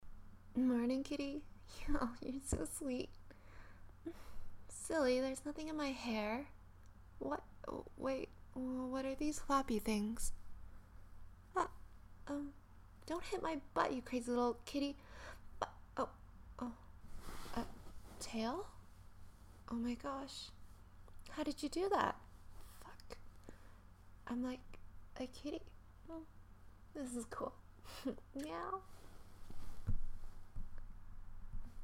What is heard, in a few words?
Meow